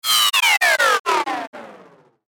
Stutter Digital Buzz Descend, Stutter Short Low Static